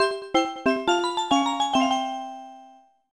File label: VS Mode Lose Theme; SM64DS